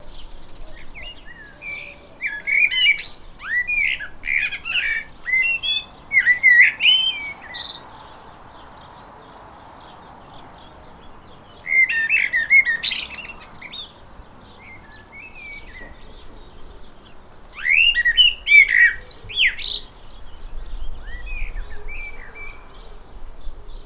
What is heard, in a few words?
blackbird using ME31 and foam windshield; normalised